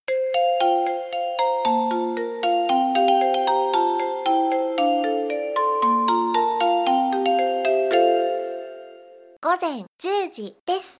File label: signal